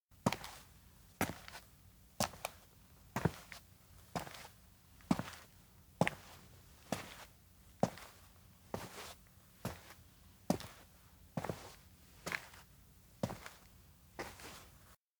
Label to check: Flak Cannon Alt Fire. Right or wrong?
Wrong